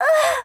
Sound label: Harpy Death